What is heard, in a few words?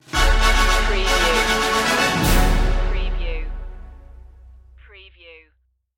march on oblivion stinger